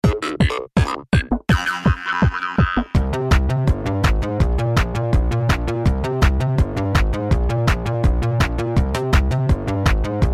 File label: Electrique 165 BPM